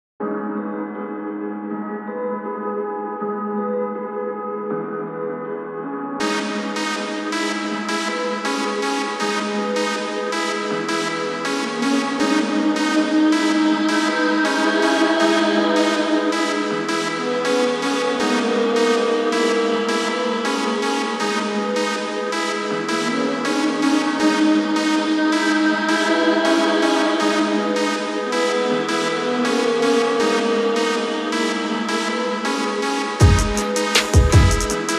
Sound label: Modern Hip Hop